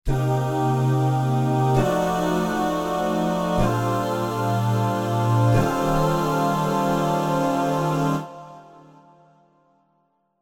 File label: Dahs Mixed, Jazz Group Multisamples; Spectrasonics Vocal Planet